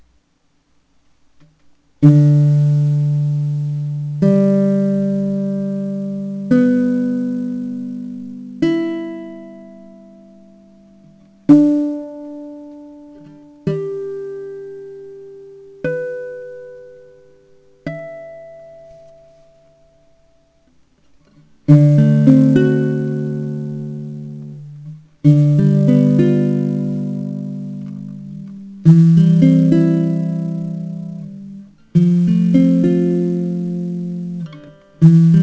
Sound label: bari note, ukuleles